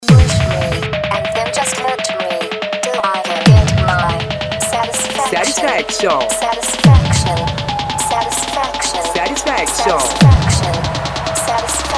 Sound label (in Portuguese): som automotivo; rebaixados